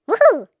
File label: woohoo